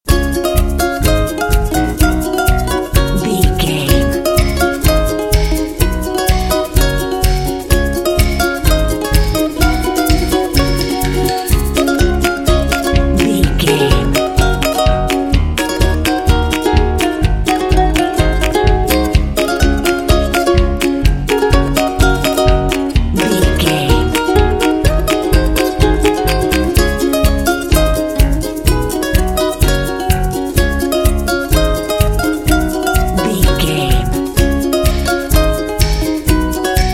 tropical fun

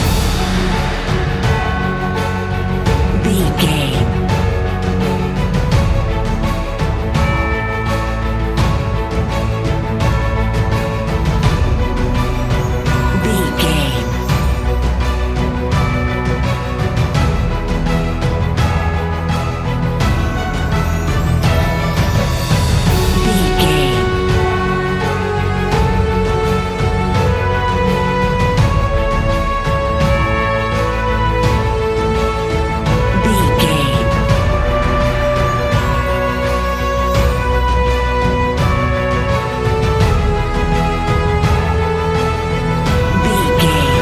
epic emotional loop

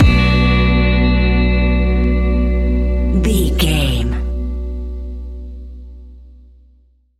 calming chill spa stinger

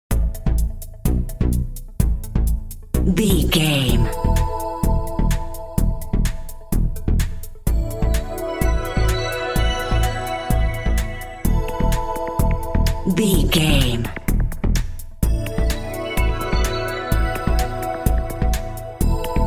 future technology narrative

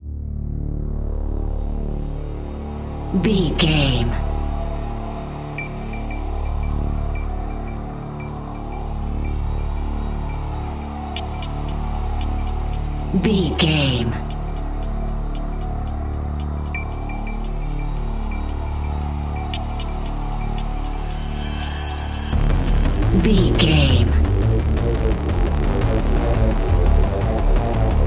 deep intelligent electronic game music

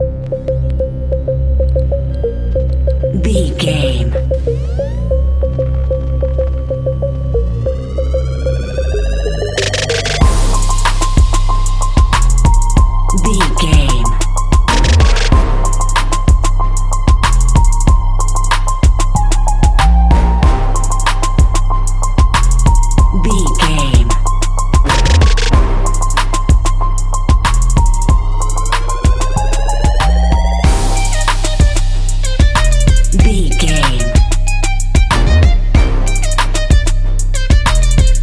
street trap

